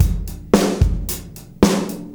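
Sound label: 111 bpm, beat loops; Zero-G; Monster Beats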